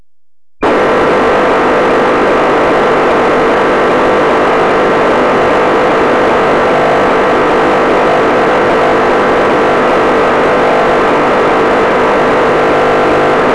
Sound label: DV Received FM, dstar